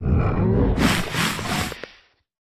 嗅闻声; 监守者

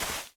可疑的沙子放置声

